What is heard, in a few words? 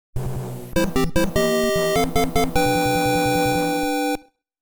Fireworks Fanfare; famicom; Game Sound Museum; Super Mario Bros. 3